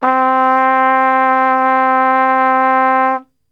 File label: C 2, Brass; Best Service; Brass Super Section